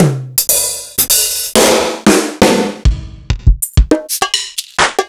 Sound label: Korg T1